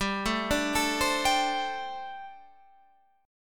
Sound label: G Suspended 2nd Suspended 4th, Guitar Standard; Gsus2sus4 arpeggiated; JGuitar